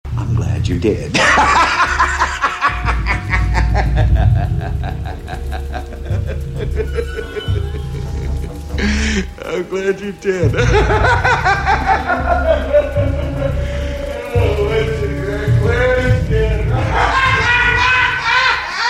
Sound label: Joker Laugh Ringtone; ringtones; Jack Nicholson